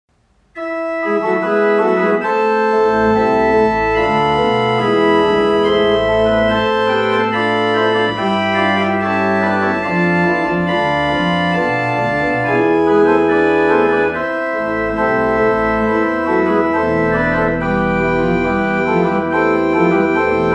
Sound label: Klais, pipe organ